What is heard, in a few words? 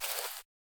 footsteps single outdoors; creature movement; psfx